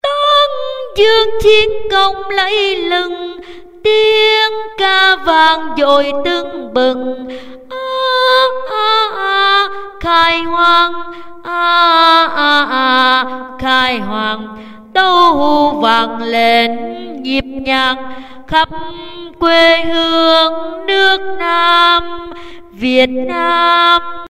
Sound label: basso